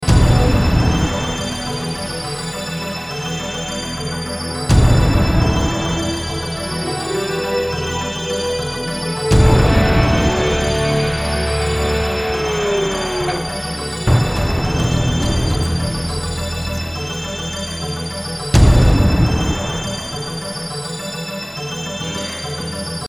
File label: Myst dry